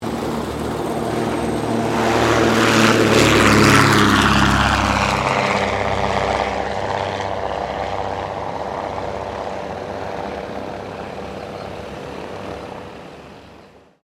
AIRCRAFT DEHAVILAND DH-84 DRAGON TAKEOFF, Dehaviland Dragon Biplane; 1935; Airplanes (Sound Effects:Vehicles)